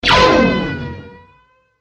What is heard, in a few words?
pistol